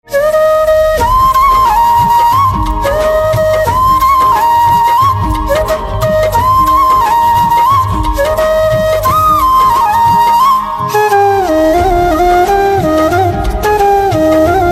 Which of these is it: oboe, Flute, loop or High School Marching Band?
Flute